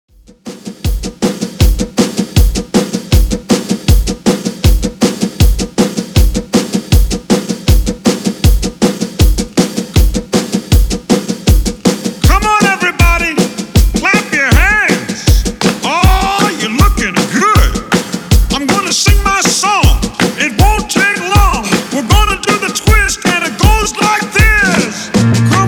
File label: 158 Bpm; Redrum; remixplanet